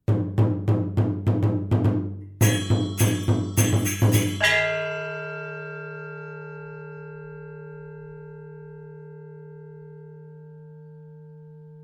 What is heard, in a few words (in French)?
Appel Bing, Gamelan